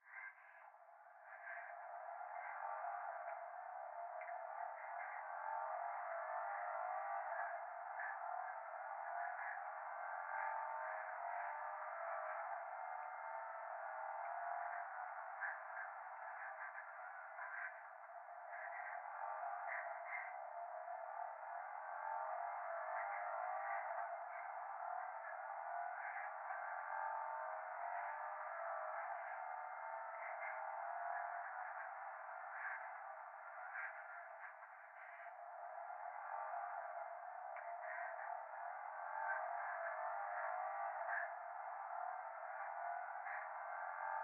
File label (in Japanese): 風呂 右耳快感吐息 車の中